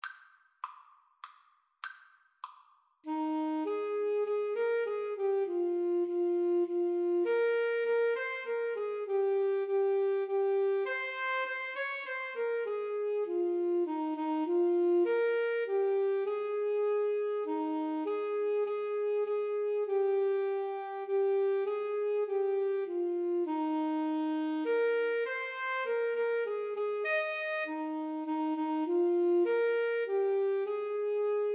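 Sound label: Alto Saxophone Duet, karaoke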